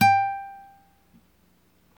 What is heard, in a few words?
GTR Nylon, Nylon Martin